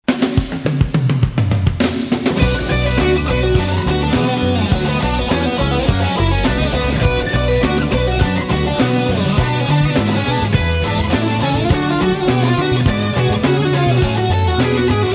Scherzo H-minor, Classical Rock

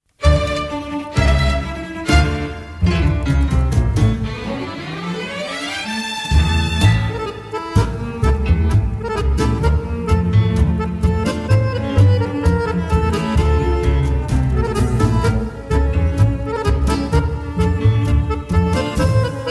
Mucho Tango, tango

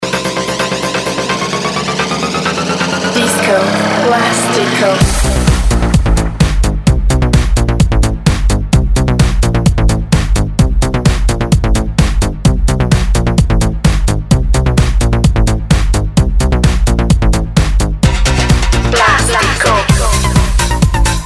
disco

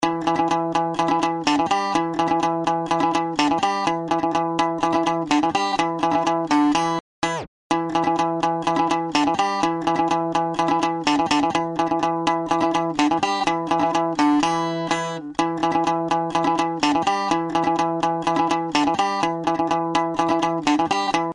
japan solo

